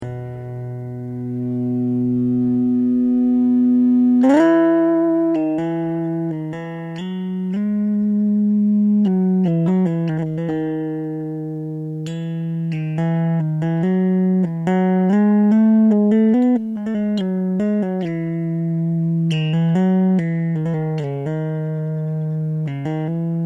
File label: E-bow, bass